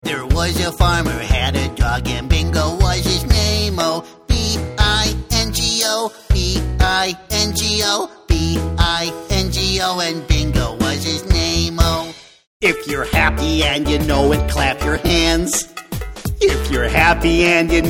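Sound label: American, songs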